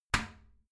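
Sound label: toilet seat lid close regular, bathroom; haus-garten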